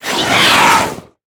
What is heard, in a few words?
Death